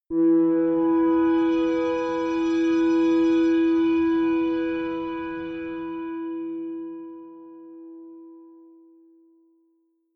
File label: sunrise